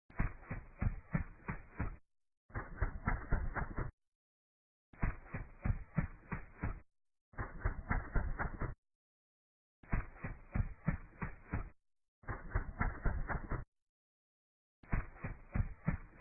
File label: running; fnaf 4 fredbear